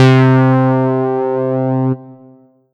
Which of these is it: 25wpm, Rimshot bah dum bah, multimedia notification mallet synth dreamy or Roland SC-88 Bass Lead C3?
Roland SC-88 Bass Lead C3